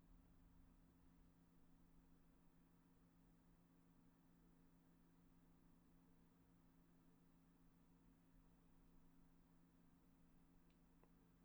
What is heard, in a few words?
Roomtone